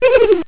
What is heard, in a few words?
giggle